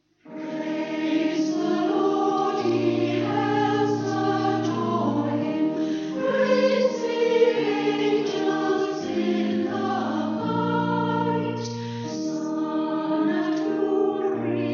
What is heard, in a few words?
choir